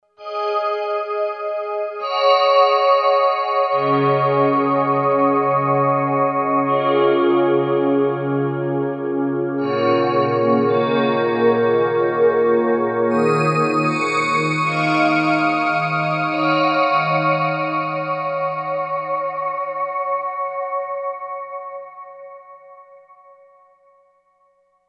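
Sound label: Pad Stalactite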